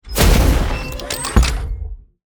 icebreaker die

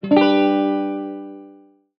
chest active

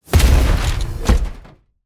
permafrost drone die

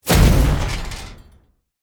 unit die

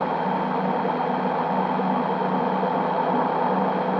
orbiter move

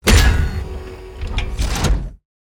molecular enforcer defend

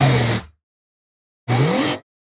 overclock orbiter defend

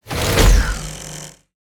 drone defend

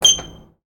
button tap

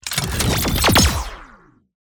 pressurizer attack

